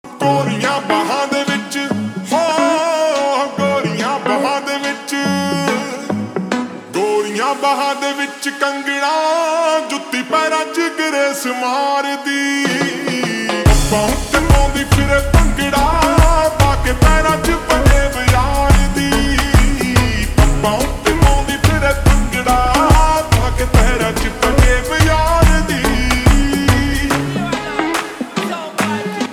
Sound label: Ringtone Slowed Reverb